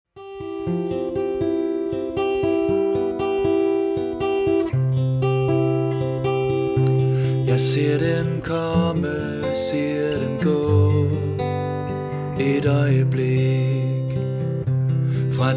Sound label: solo dinner